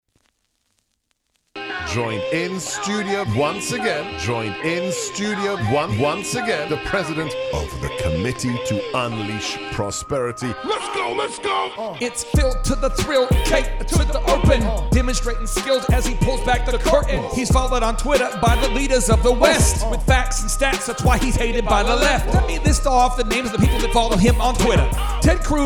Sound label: Rap